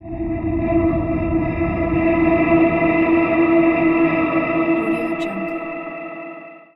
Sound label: wind whoosh